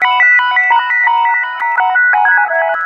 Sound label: lead (sparkle); Sonic Foundry (Sony Creative Software); Ugly Remnants VOL-2